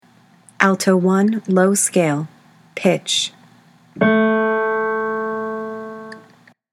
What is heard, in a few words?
alto i scales low; all-state choir